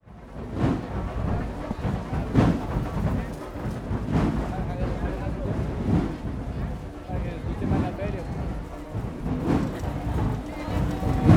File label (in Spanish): Caminata con banda en la feria; de Plaza Viquez